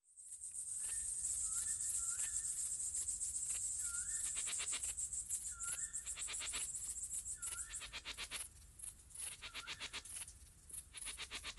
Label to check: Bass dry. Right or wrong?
Wrong